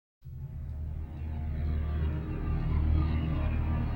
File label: loop Eb